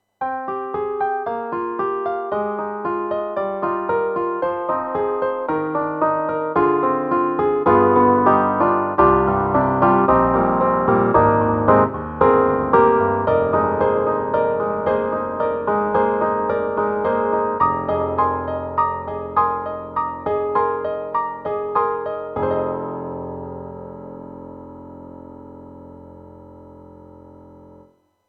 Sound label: YAMAHA MU500 Oldest AP